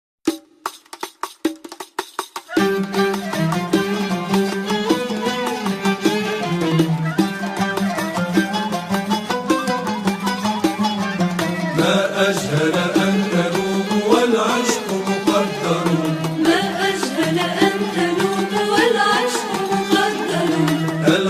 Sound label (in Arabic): موشح اندلسي Andalusian Arabic